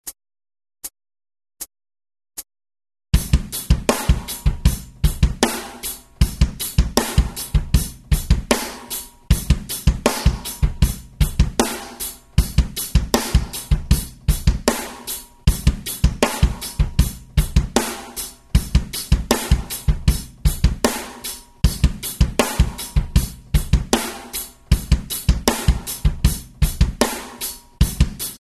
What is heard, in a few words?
drums